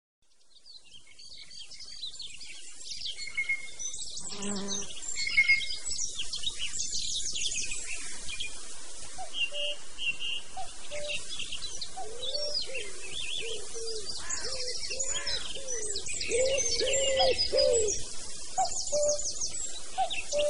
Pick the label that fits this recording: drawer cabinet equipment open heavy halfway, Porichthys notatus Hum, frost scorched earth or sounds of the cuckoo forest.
sounds of the cuckoo forest